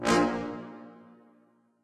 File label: fire